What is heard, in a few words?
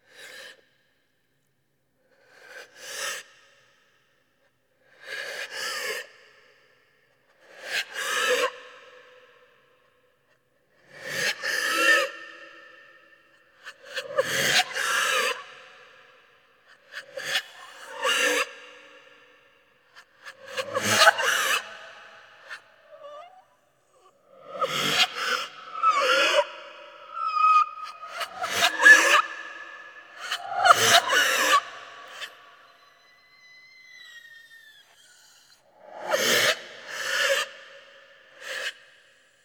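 terrible female breathing effect reverse echo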